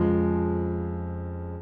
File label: piano low